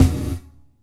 Gated Snare; Univerb; creative gate; Gated Reverb

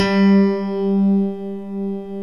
DIG ACU PIANO; OMI - Universe of Sounds

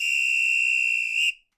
Samba Whistle; Sonic Foundry (Sony Creative Software); Joe Vitale Latin Percussion

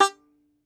Tenor Banjo, Plucked Strings

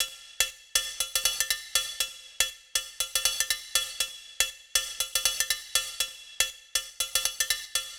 CowBl Loop 120, Perc Loops, Loungin House; Equipped Music

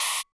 Drums; Distored Reality

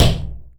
PUNCH, Anime Sound FX; AKAI Anime Sound FX

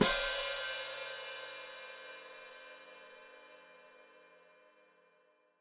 Hat Closed Open, Hi-Hat

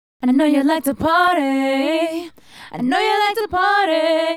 Female Group, Hip-Hop and RnB Vocals

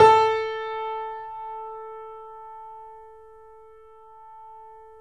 3D Pianos Yamaha C7; E-MU Producer Series Vol. 5

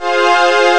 string pad min; Amiga Soundtracker Sample Packs

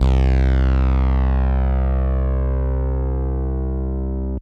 Synth Prophet Bass C; One Shots; Electronisounds

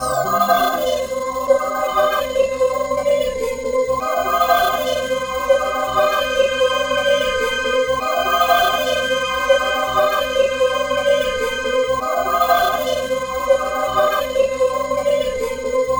Pulsar Voices, Vocal; Sonic Foundry (Sony Creative Software); Myths of Technology

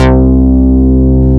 MOOG, Synth Bass, Moog Basses

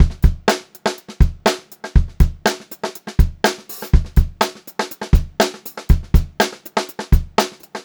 122 funky beat, funk groove drumloops; USB Soundscan vol.38; AKAI

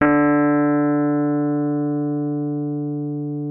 Rhodes D3; Xsample VOL-1; 90 s Sample CDs

